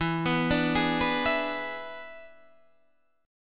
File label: E 7th E down Guitar Drop D